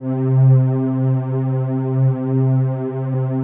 C2, SYNTH WAVES; Music Store Sound Service; Einstein

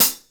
HH CL, Drum 1 Dry; East Collexion

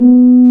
C Tuba; Solo Brass

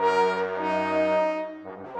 Brass

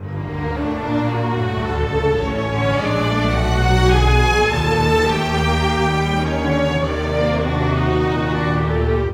Cinematic Strings, Orchestral; Sonic Foundry (Sony Creative Software); Orchestral Series VOL-3 - Cinematic

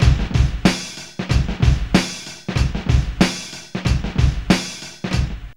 SLAMMER 94, Funky Elements LOOPS; Zero-G

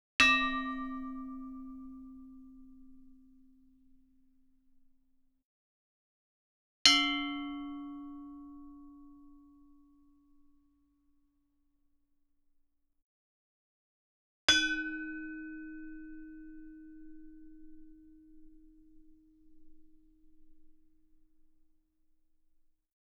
Gamelan; Propeller Island - Complete Gamelan

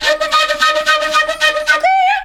FLU + VOC, FLUTE + VOCAL

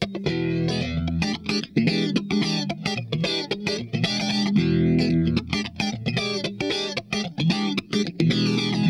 GRIND, Funk Guitar; 90 s Sample CDs; Zero G

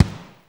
Kick, Fairlight CMI III

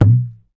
Dholak; Roland BK-5; Percussion

